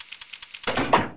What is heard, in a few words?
Lever; Sotha